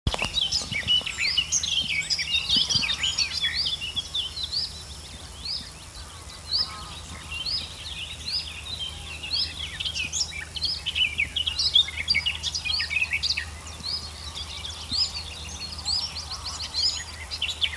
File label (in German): zilpzalp